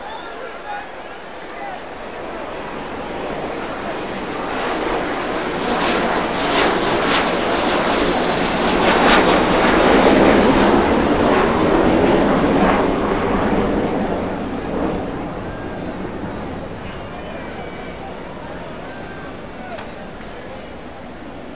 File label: crowd; 8bit